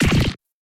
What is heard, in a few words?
ankle spoil